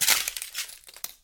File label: broken glass step; Golden Crayon Sounds